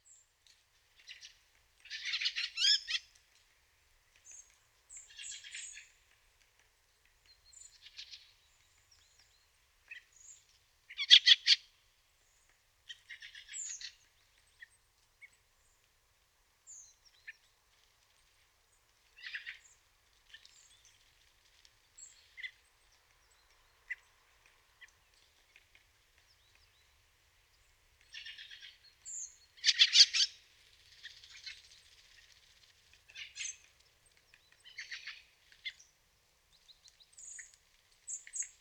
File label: Fieldfare